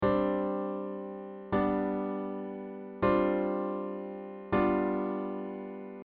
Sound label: G7sus4